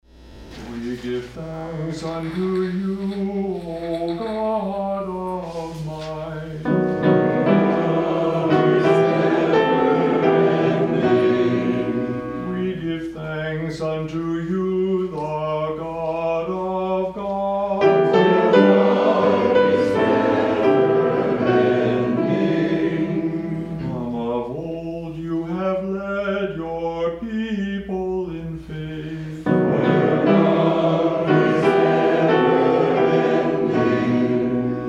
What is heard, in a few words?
hymn; We Give Thanks Unto You